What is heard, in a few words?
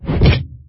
Attack